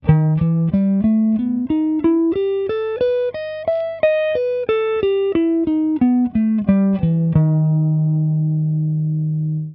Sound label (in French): Penta harmonique 5e position, Guitare; La pentatonique harmonique & mélodique; 5ème position